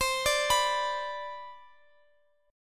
C Major 7th Suspended 2nd, C, down, Guitar, Open F; CM7sus2; arpeggiated